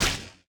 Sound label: shot; sky dragons fury